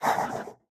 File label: biter call, creatures